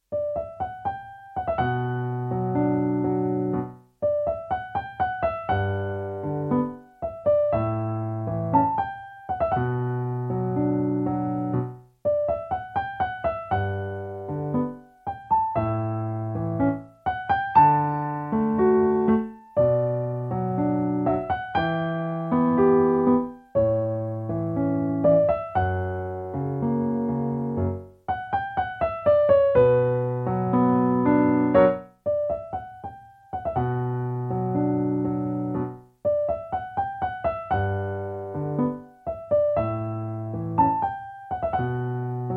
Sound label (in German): Ein Tango voller Leidenschaft, Endtempo